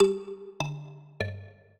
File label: trio, mbira; Mozart